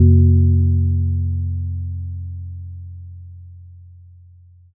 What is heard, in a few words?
gong